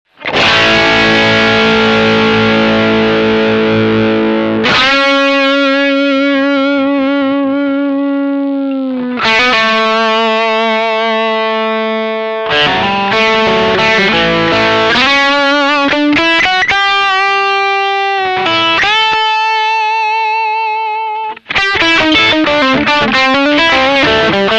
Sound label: Bypass; MAXON AD999